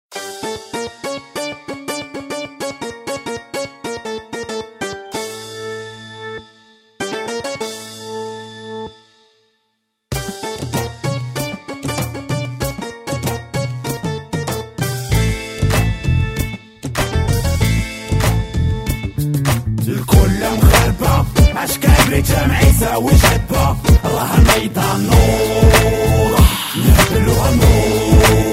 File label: Rap Dance